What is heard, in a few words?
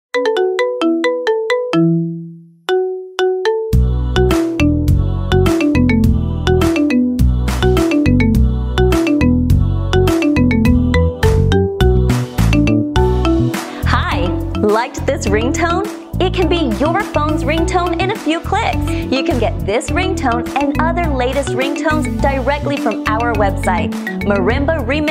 Marimba, zil sesi